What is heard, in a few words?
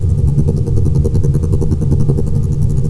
heavy move; Bonzala; Revista CD Expert 50